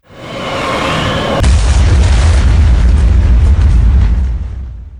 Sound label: Explosion; Kazhul; Gamigo